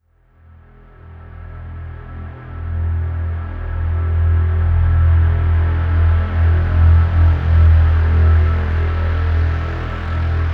Overlay Drone

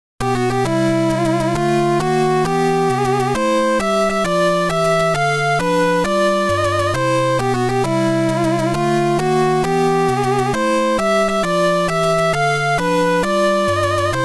Ending BGM; Famicom Sound History Series Mario the Music

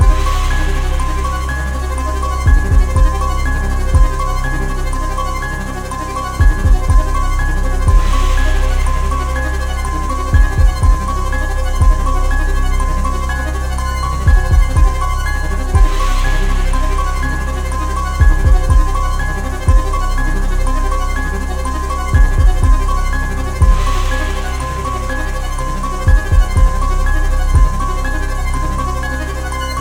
Music Intro 122bpm Loop; Sockventure

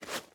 ice jump

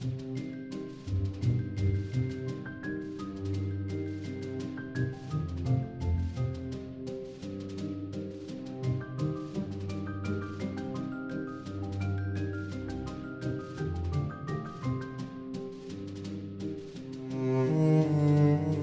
Music Tutorial Loop; Curse of the Sea Rats

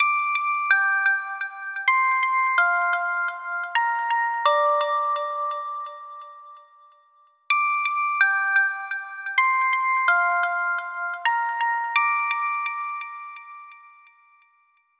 Melody; super crush k.o.

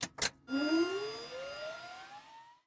vehicle electric ignition

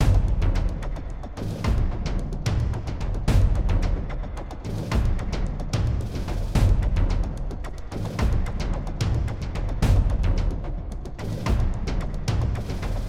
Garden NOACTION PERC loop; Forced Showdown

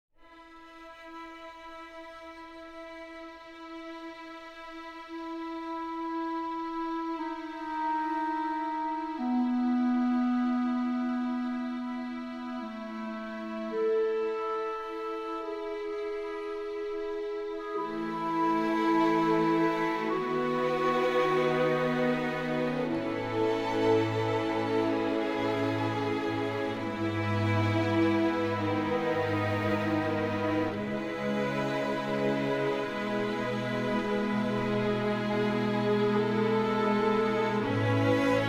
sad optimistic orchestra drama

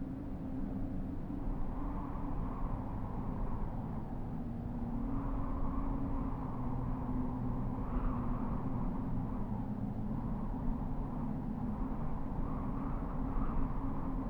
Ambient Outside

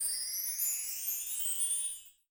health sparkle

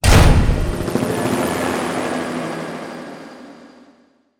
Tap; Yu-Gi-Oh! Master Duel

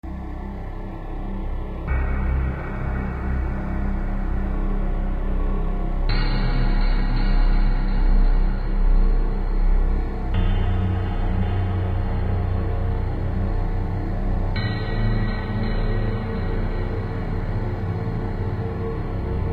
Sinister Cellar; A Timely Intervention

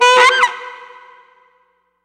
sax death; stranglehold; mus jpb mega sax death

